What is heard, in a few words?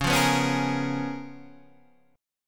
C sharp 13th C sharp, Guitar, Standard; strummed; C#13; JGuitar